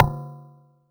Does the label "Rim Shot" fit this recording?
Yes